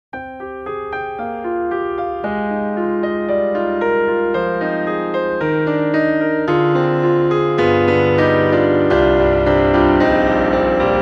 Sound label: Rosewood Grand Jazz Hands; Orange Tree Samples; Evolution Rosewood Grand